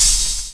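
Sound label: open hi-hat 70s, drum kit; E-Lab; X Static Goldmine 2; akai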